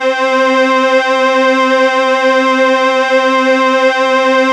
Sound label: SIEL, String Machines